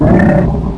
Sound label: HURT